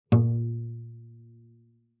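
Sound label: Cello pizz fortissimo sul C Bb2; MIS Pitches - 2014; Strings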